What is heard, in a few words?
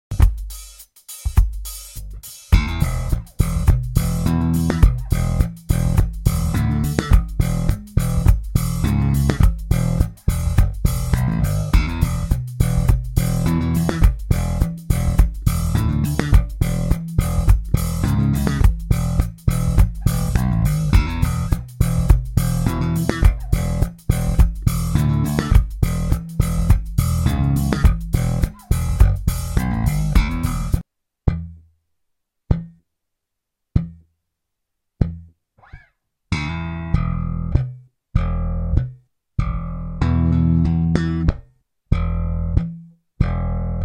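Slap with strum and powerchords in Em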